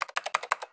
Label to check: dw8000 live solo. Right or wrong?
Wrong